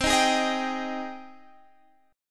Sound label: Ab Major 7th C